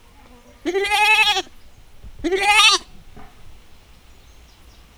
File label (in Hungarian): gida szél; állathangok; paraszt udvar; házi kecske